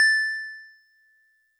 DigiPianet DX100 A7

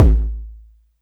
BD

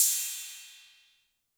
Cymbal 808 Tape Decay, Clean

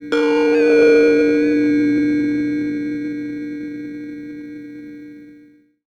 50s Synth Bell

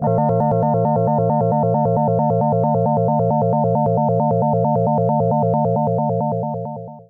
SEQUENCE Linear

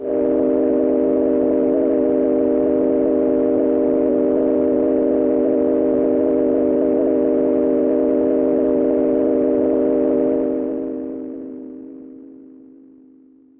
PAD

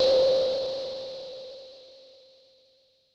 SYNTH Tone; Mercury

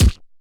BD Chunk Stereo

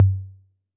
Tom Low A 808, Clean Digital

